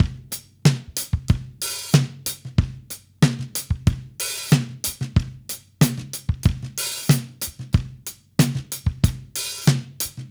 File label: Mixed Berry Jam Drums, 70s Analog Funk